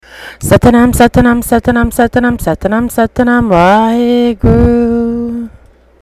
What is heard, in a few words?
8 beats